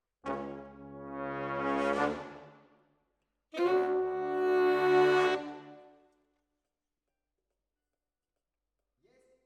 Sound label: Sax